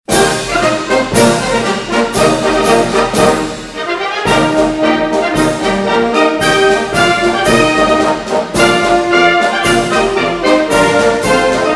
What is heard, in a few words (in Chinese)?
运动会进行曲 体育运动